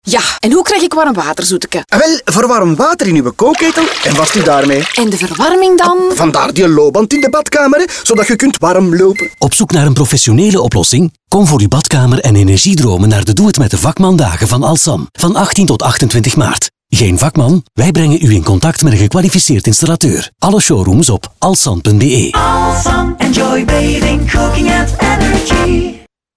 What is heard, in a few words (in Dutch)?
loopband